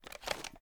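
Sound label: weapon foley assault rifle reload rotate; weapons; gmod; viper; scharlie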